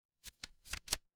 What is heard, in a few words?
paper fabric page turn